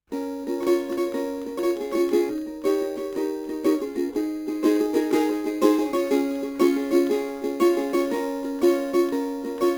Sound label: bitcrusher